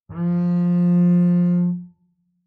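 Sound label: Bass arco fortissimo sul A F3, Double Bass; MIS Pitches - 2014; Strings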